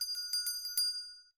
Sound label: bell shop; angband